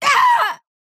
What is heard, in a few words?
pain laser; akira